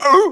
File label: Aaa Hurt; Picnicboat; Neighbours From Hell Console Edition; GC, Xbox